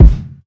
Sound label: kick full